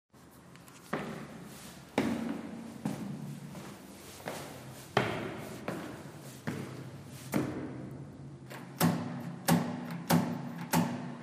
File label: locked out